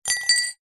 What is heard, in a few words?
household cutlery item set down into glass; zapsplat